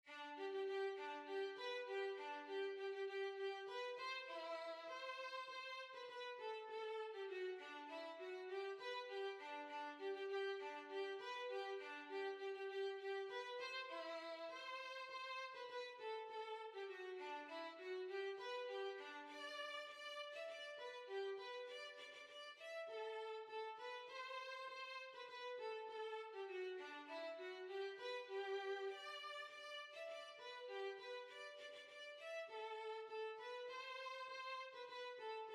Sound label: Polketta, Violin